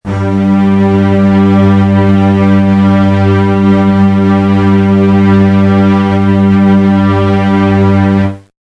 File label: bass G; tuneguide